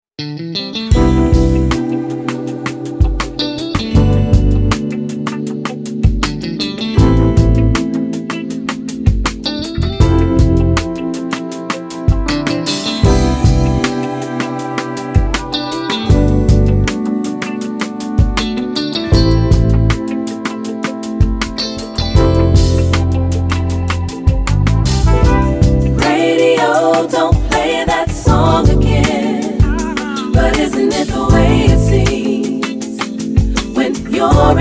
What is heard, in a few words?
rb hip hop; Demo Reel - RB and Hip Hop